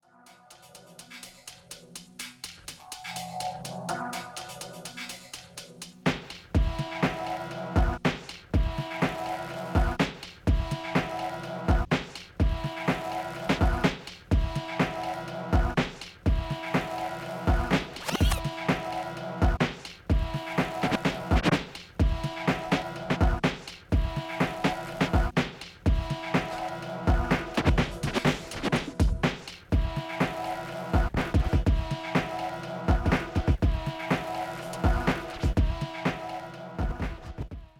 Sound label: juggling halfspeed snare